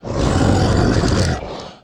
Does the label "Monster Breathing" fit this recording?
Yes